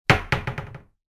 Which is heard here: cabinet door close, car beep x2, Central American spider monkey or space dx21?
cabinet door close